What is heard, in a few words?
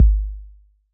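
bass drum 808 Decay C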